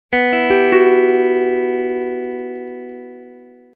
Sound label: Minor key clean guitar; Music logo; Hollywood Edge Sound Designers Tool Kit